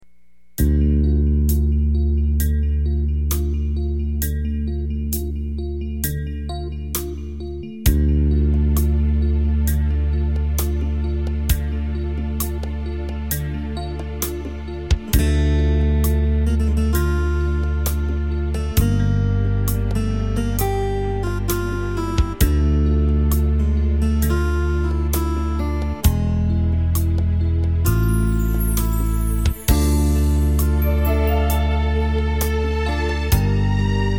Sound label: slow surf; yamaha